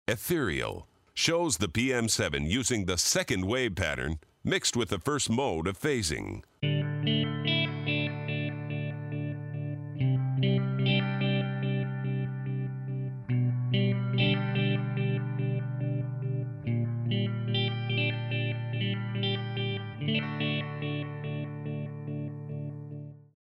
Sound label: Ethereal